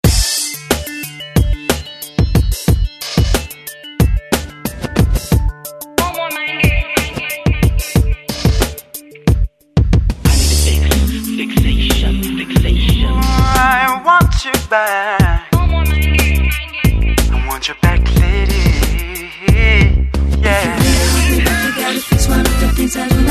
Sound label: Deep Bass; Motorola Hint-QA30